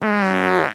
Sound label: fart short; Sonniss GDC